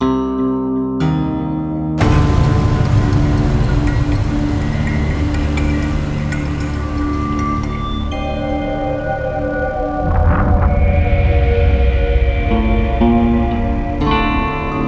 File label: Round Start; Buried